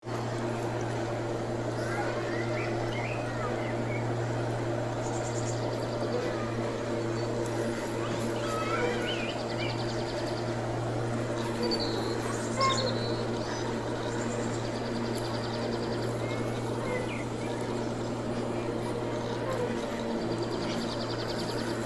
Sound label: Park atmosphere maintenance with distant lawnmower